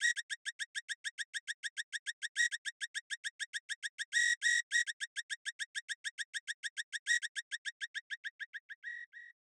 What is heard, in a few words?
Whistle Fx